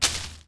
bush; Mafie 1

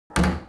wood; Mafie 1

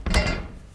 door; Mafie 1

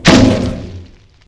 bush; Mafie 1

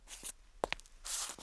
preslap; Mafie 1

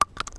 sand; Mafie 1

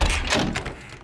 glass op; Mafie 1

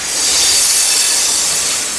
tram; Mafie 1

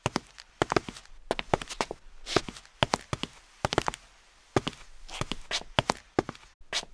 punks; Mafie 1

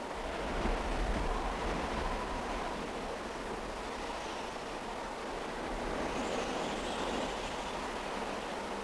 wind; Mafie 1

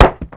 wood; Mafie 1

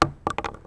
wood; Mafie 1

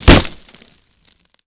glass; Mafie 1

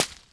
grass; Mafie 1

